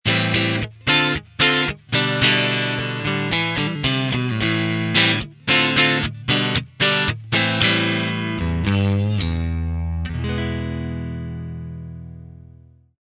steel twin neck clean; axetec

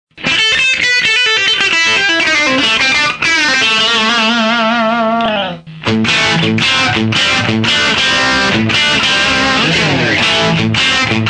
texas loco bridge dirty; ainsley stones; axetec

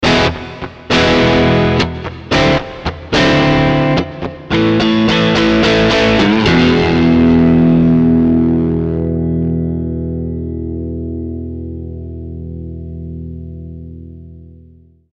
vintage 6ts dirty middle; axetec

